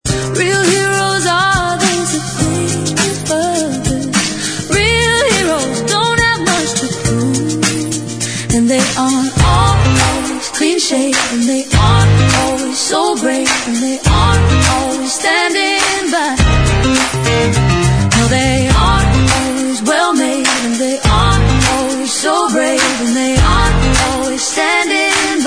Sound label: Female Eng Folk; Power Pop/Theatrical